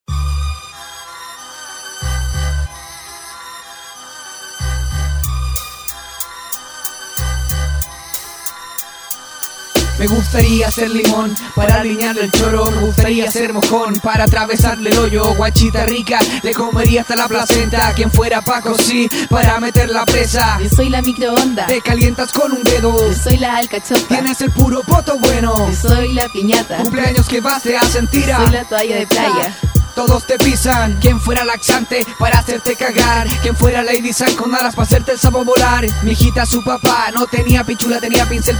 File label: hiphop